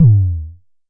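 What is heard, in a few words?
kick, Jomox MBase 01, drum machine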